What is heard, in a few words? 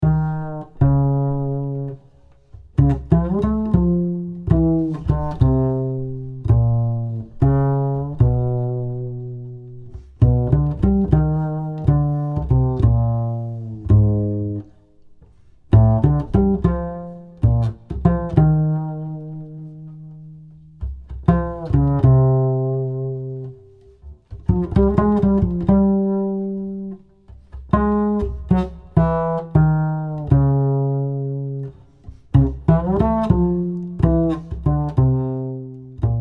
Bass sample solobas